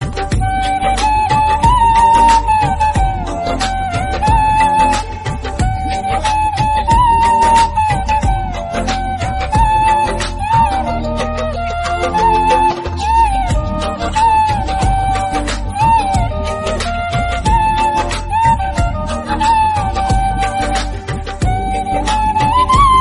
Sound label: instrumental flute